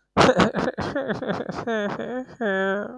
Human sounds Human voice Laughter Baby laughter, vocal imitation